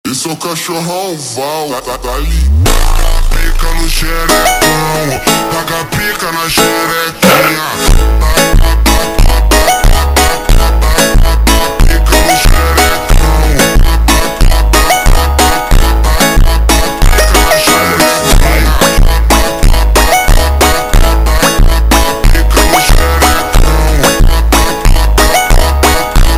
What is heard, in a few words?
ultra Slowed, funk